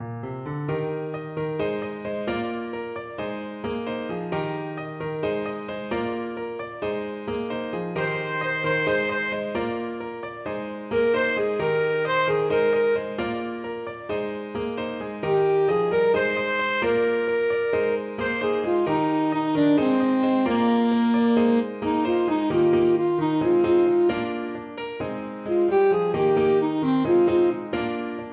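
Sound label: alto saxophone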